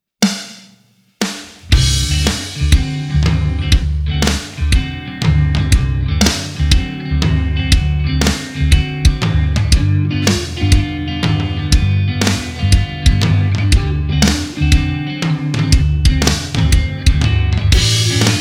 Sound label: D7 Blues